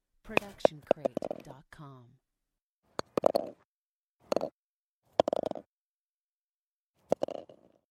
golf ball fall in hole mono; soundscrate

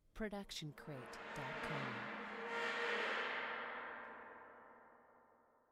gong screeching

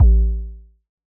electronic kick distorted; SoundsCrate

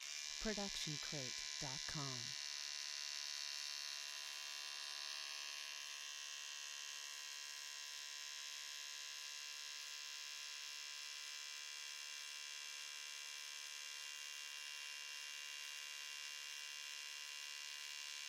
Electric Buzz

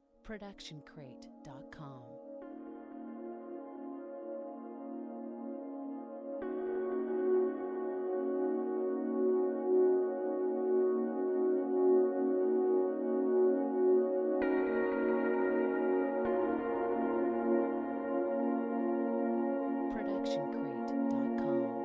warm analogue pad; soundscrate

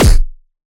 Anime Super Punch

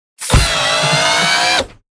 Robotic Movement; SoundsCrate

